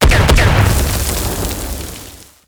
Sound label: gold explo buff